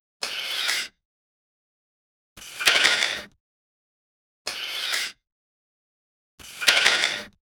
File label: quick push in slide